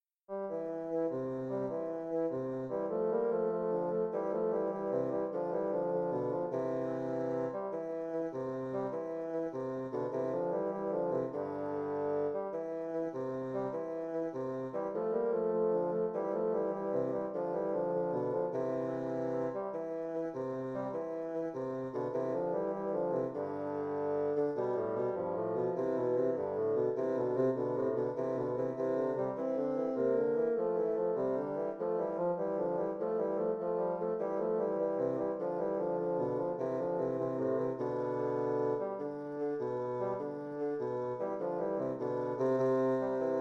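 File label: bassoon duet; der kuckuck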